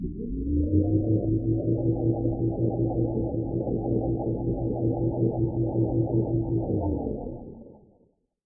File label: silo raise rocket; factorio; Factorio 0.14.21